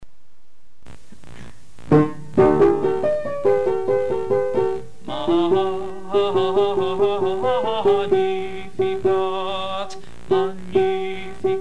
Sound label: Magnificat; Bach